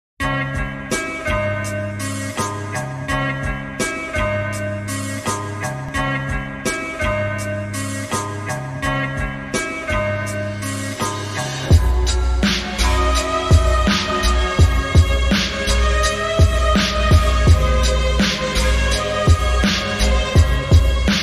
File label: beat slowed reverb